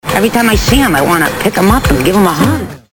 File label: hug pickup